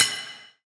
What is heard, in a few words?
Ring Tops, Industrial Hits; Antidote Advent